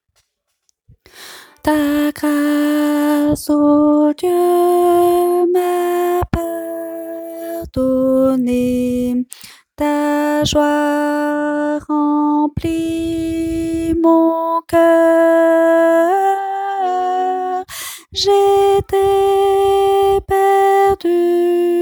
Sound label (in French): alto; chant 166